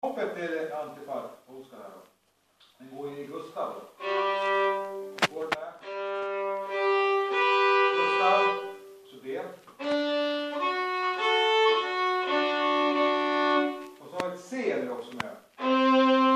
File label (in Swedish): Polska Komp